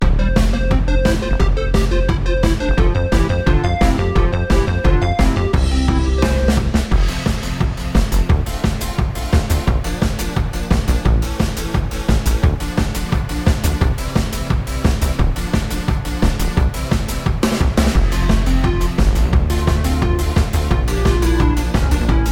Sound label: oldie pop game music